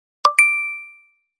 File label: ting ting cool message tone ringtone, sms ringtones